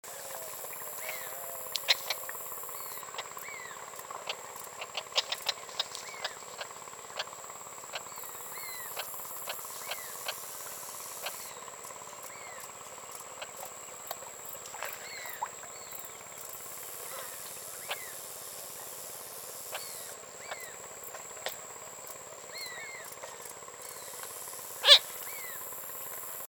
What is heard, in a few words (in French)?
Talève favorite